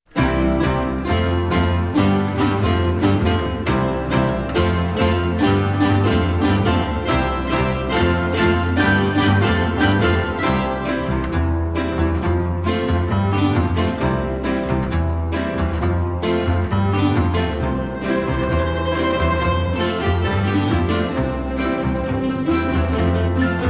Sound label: Rock Tambure